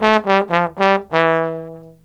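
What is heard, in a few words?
TROMBONE SOLO, BRASS; BRASS KIT; Ragga & Dub Reggae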